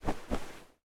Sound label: slap cloth light